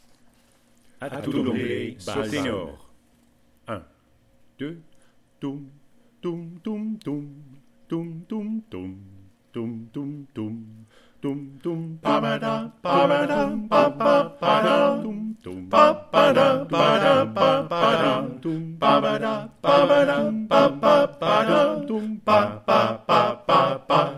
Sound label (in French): tutti